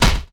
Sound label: Enemy Rose Queen Active Fence Hit